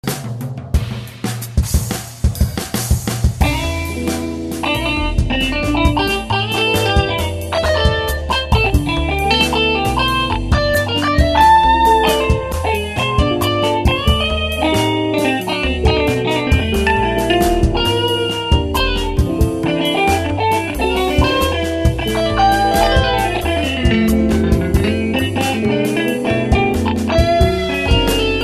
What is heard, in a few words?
Free Jazz